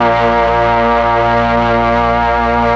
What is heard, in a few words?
string; ILIO; Propeller Island Legendary M400